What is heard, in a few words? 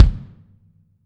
R B Kick Close, drums, One Shots; Sonic Foundry; Sony Creative Software; Drums from the Big Room The Mixes; R B Kicks